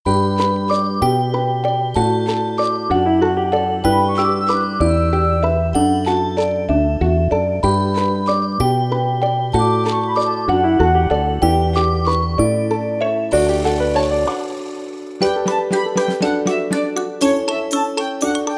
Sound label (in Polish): szybko wolno instr